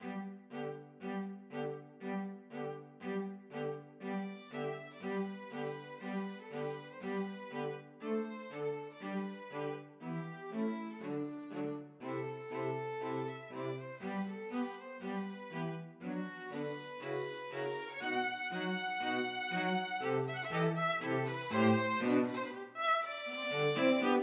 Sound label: carmen toreador, string quartet; bizet